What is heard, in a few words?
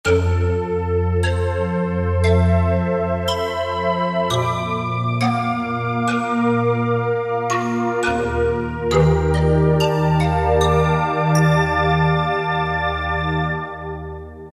Steam Synth Pad, Roland PN-D50-04